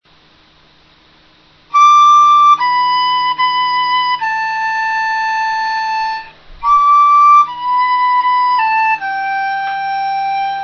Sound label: dizi